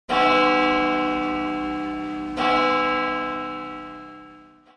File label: bell chime 2x